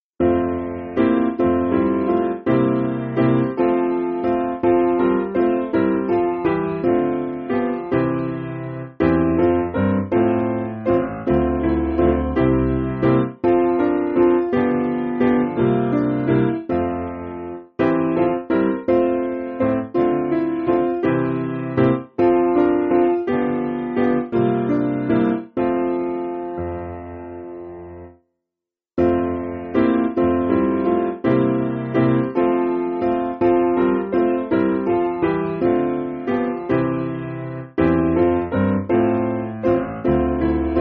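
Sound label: Piano, Music Backing Files for Churches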